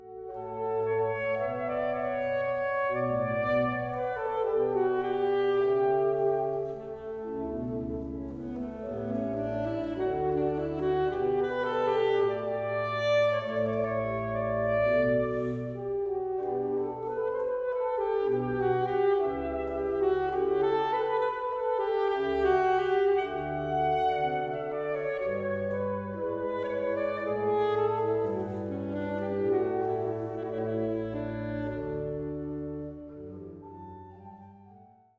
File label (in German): Sax Orgel; saxophon; Friedenskirche KS; J.S. Bach BWV 1035; 1. Adagio ma non tanto; Friedenskirche KS 08.09.2023